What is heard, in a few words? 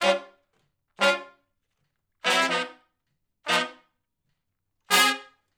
Shuffle Blues Riff [V, IV, I] (Ab); Sections; Horncraft for R&B; Crimson Blue and Fabulous Horncraft 4 RnB